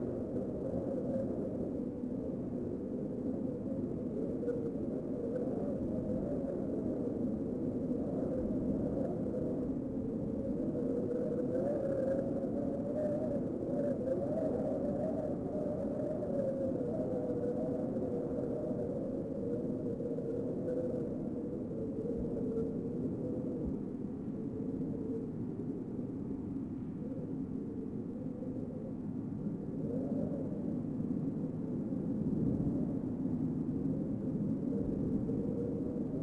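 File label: Desert Bed Loop, Ambient; Civ4 warlords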